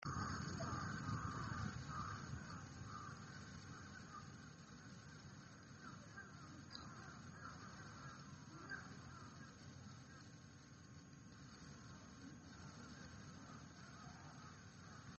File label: crow